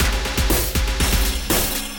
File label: Scorched Kit, Drums